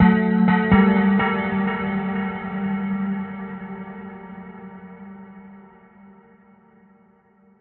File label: bell echoes, synth and electronic loops